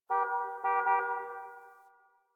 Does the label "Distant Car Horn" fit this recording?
Yes